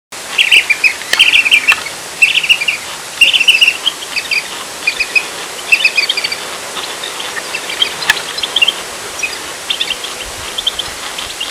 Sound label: The Egyptian Vulture; Misir Akbabasi Kucuk Akbaba